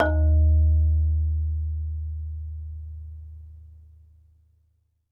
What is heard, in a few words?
MARIMBA BASS; ILIO